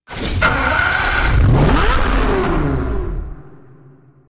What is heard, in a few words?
Ignition; zonda Cinque